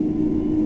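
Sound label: Omen Ambience Strike; Sid Meier's Civilization 4 Complete; Warlords; Units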